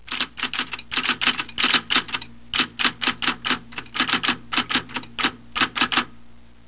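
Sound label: TYPEWRITER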